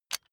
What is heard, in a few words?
electric button drill direction switch forward heavy